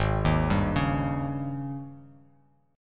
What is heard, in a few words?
G sharp Major 7th Suspended 4th; arpeggiated; 4,6,5,6